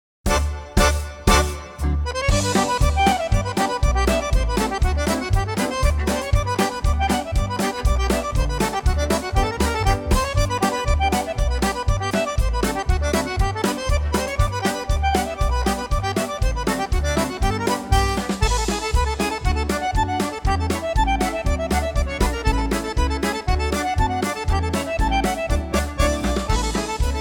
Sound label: Accordion Medley